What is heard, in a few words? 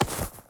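item generic up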